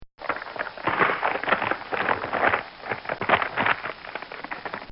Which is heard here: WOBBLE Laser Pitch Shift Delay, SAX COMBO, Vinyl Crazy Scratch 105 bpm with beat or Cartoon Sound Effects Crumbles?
Cartoon Sound Effects Crumbles